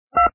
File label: DTMF 3